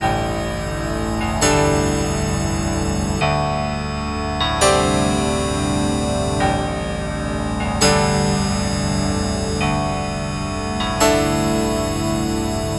Piano Cosmic Mud, Drum & Bass Keys; Sonic Foundry (Sony Creative Software); Knutrix Drum & Bass

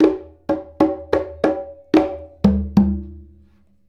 Congas; Essential Percussion

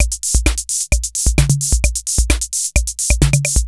KR33 ROCK; Crypton - DrumBase5000

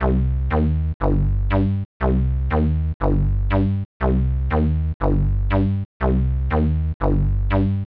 120 Cm bass, bass loops, trance; Trance Explosion Vol1

